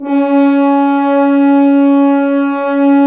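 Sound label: BRS, French Horns sfz; Roland LCDP06 Brass Sections; F.Horns 2 f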